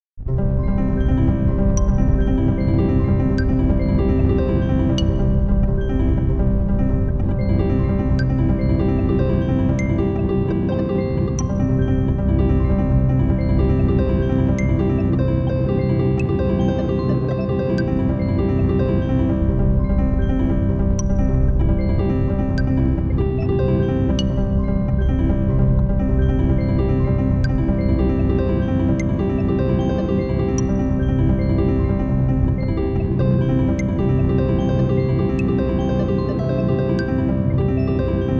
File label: Rushes blown in a storm; fractal music